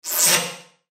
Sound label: Sci Fi Menu Screen Digital Whoosh; Control Panel; Blastwave